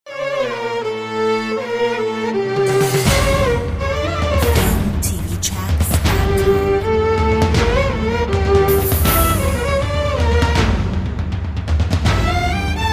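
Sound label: percussion mix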